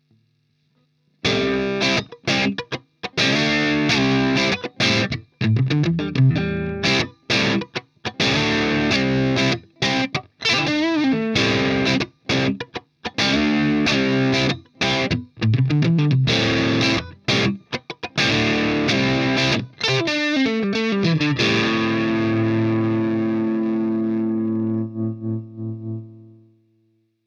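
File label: strat crunch; squier; brunetti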